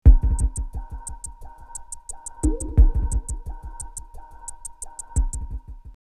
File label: Fusion 88, drumloops DrumMachines; X-Static Goldmine